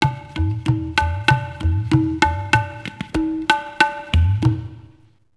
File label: african drum